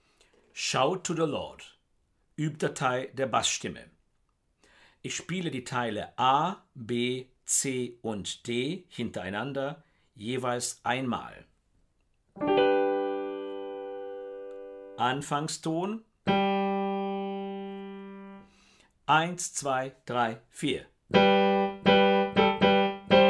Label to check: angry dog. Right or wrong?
Wrong